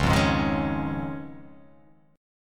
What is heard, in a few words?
C sharp Major Add 9th